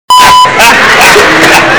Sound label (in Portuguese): risada